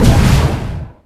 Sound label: missile fire bass; weapon